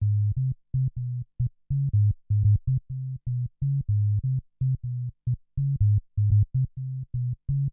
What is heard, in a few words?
basse